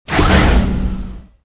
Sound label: door medium; movers